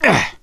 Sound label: melee swing, gambler, survivor voice; source engine; left 4 dead 2